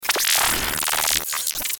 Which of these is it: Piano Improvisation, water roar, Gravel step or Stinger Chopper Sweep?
Stinger Chopper Sweep